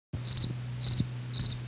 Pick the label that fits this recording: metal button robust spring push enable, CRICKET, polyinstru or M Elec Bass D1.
CRICKET